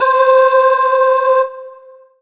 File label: Roland SC-88 Voice Lead C5